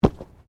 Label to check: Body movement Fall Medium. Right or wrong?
Right